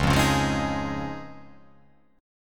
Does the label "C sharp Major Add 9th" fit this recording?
Yes